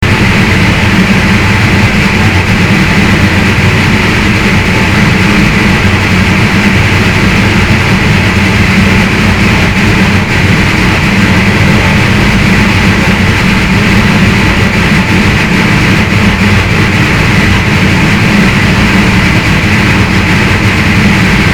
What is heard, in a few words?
Stall Sound